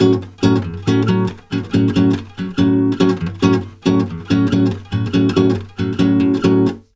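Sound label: bossa A, 140 major chord; USB Soundscan; AKAI; Brazil Bossa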